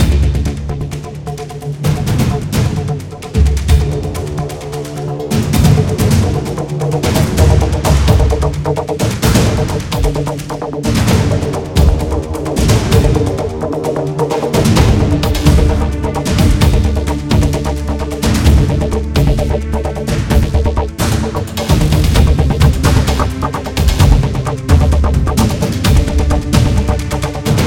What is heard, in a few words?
military idle; synthetik